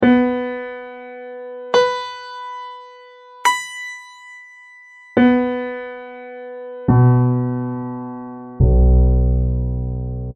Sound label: piano